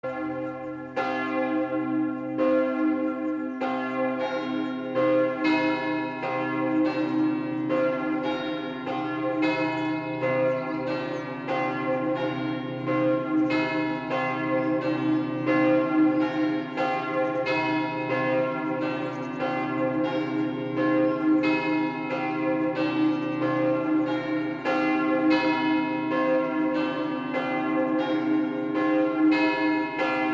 glas

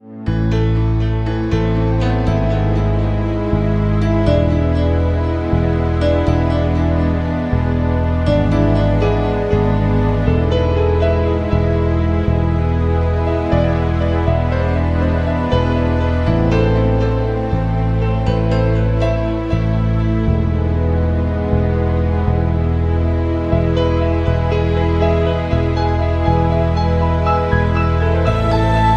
Sonnerie Relaxante

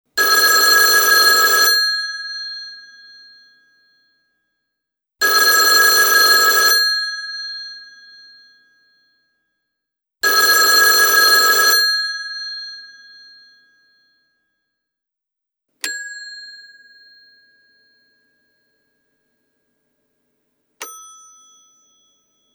telephone a cadran

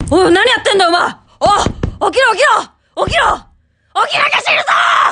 reveil anime

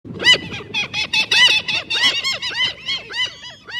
Mouettes Discutant; Sonnerie

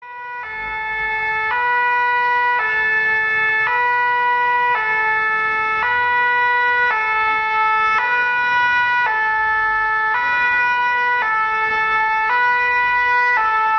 la sirene des pompier

